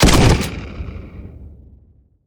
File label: fire, weapons